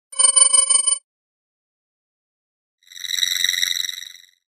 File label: Digital audio signal